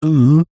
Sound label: human male middle aged rude are you thick type grunt